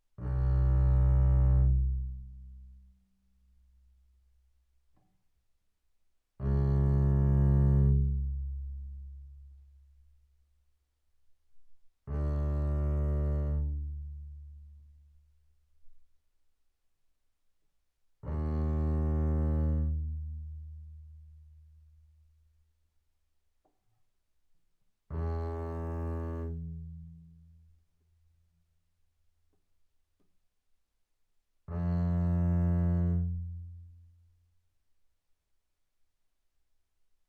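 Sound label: Bass arco sul A fortissimo C2 B2, double bass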